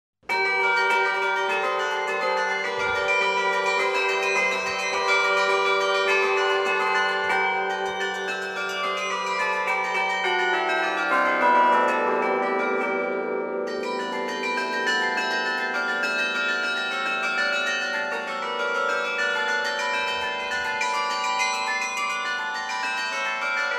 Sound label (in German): Carillon, glockenspiel; Haendel